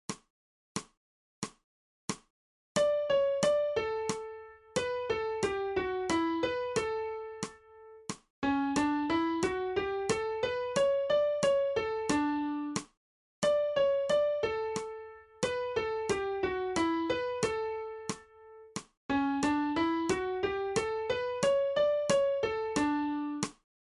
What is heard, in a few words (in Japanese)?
新フレットレス